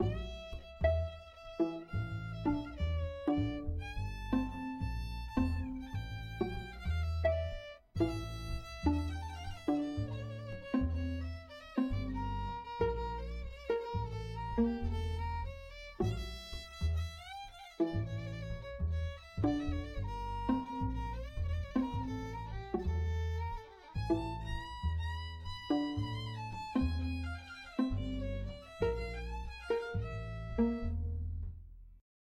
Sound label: Violin Trio